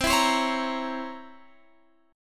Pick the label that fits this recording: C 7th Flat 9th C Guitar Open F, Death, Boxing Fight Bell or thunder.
C 7th Flat 9th C Guitar Open F